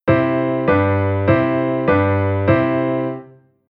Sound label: Chord Harmony Rhythm